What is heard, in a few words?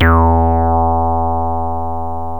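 bl square, yamaha rm1x synth bass and lead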